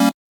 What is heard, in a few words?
Chord